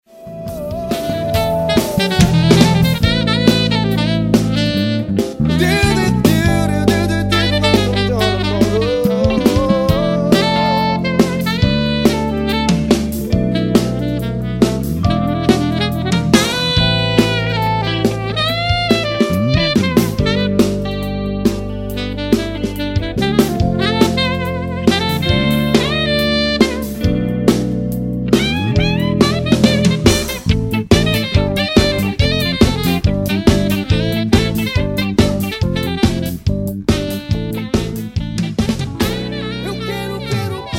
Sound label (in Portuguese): Sax alto e piano solos